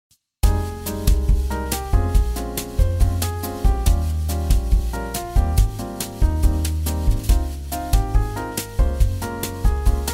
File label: Bossanova